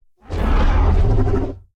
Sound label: DRY Raptor roar; Dino